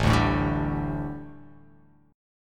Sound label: A 7th Suspended 4th, chord; x,0,0,2,2,2,x,x